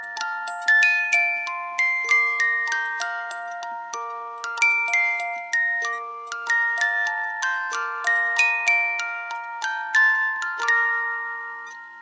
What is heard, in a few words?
jewellery box music